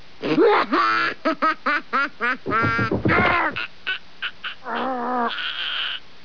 HA HA

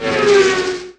500CC BY, VEHICLES

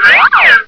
WHOOPIE

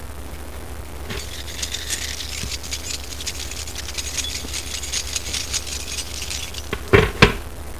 KEYS

